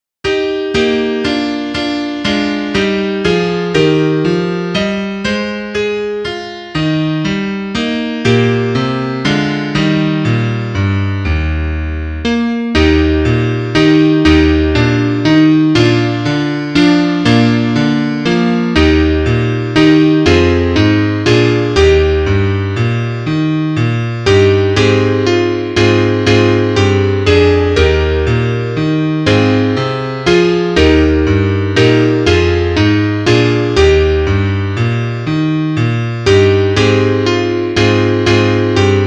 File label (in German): Klavier; in einem kuehlen grunde